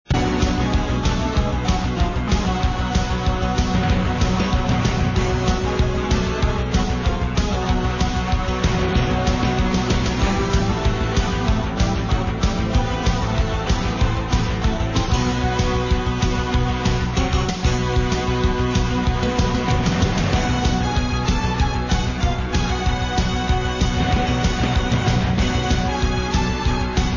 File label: BGM boss; 音效